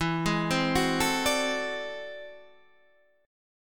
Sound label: A Minor Major 13th